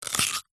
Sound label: human eat bite potato raw single